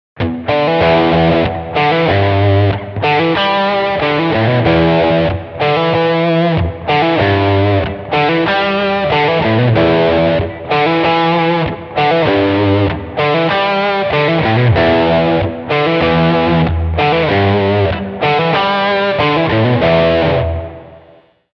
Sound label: room; strymon big sky; Rockbox; Reverb